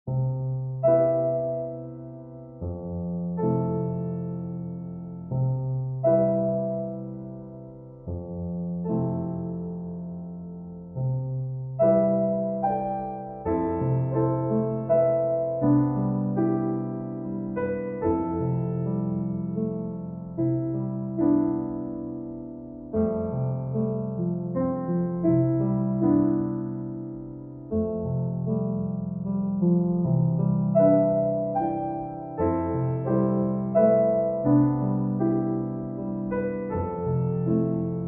Solo Piano

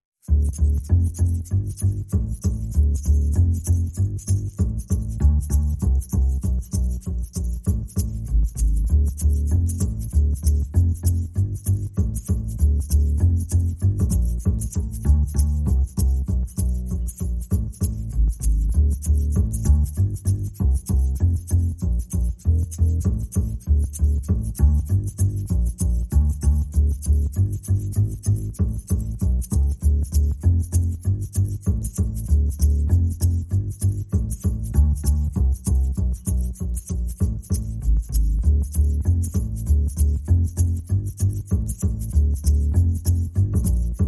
Drums and Bass

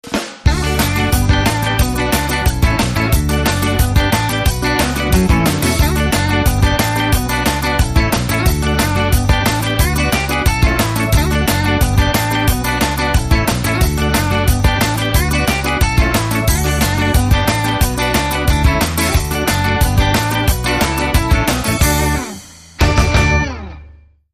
Ska Step

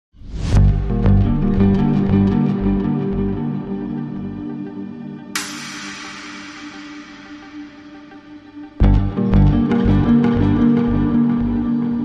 The Mill

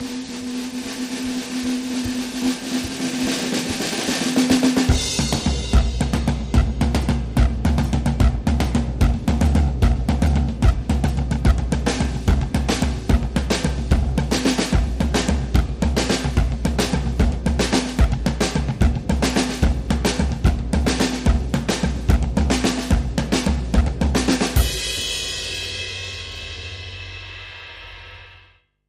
Altercation Drums Only

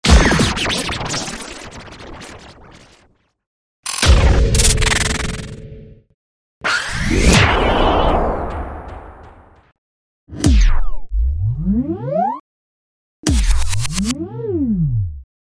Hit It Again

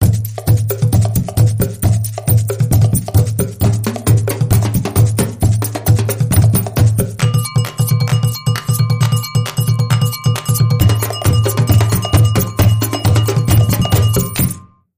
Drum Circle

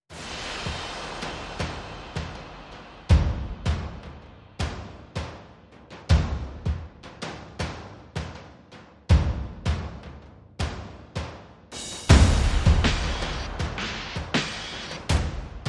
Perc and FX Only

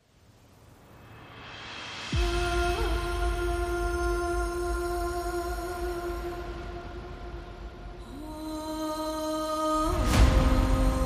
Percussion and Vocals

